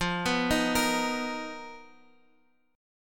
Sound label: B Major 7th F; arpeggiated